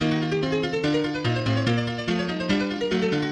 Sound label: piano exercise